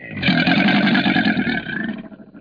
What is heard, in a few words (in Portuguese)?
monstro d'agua; 7invasores